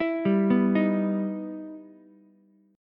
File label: E Minor Major 7th, Ukulele; arpeggiated; 9,7,7,6; JGuitar